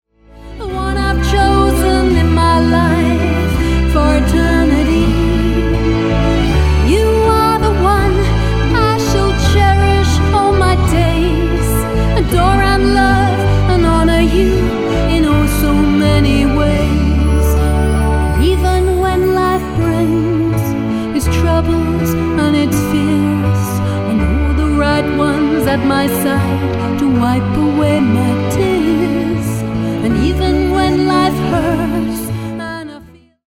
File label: orchestral reprise